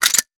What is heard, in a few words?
weapon foley pickup; Mono; Real Guns Sound Pack 2